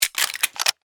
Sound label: Steampunk Device, Scifi, Steampunk, Device, Clicks, Small; BlastWave